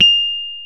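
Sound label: piano Rhodes Mk.V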